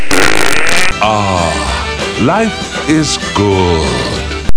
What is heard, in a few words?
aah; valve